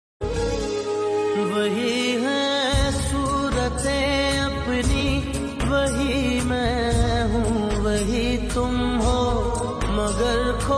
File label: Ringtone